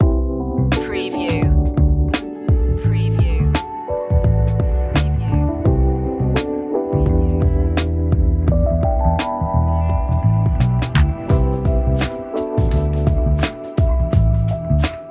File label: sweet flavor chillout